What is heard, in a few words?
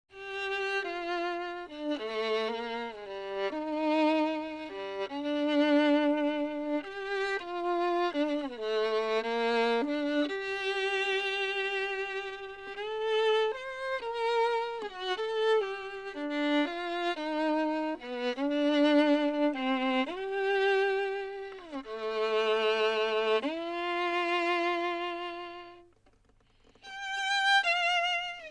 Lonely Violin; Ren & Stimpy